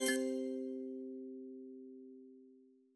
count up loop end

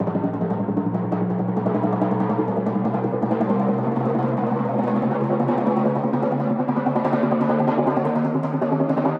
Near Win; netent

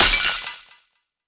win Explode Medium; netent

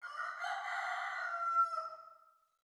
amb Rooster; netent

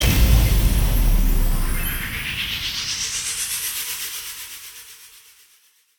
big win mega

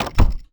Reel Bounce

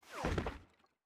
new bricks fall; netent

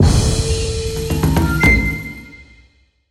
medium Win; netent

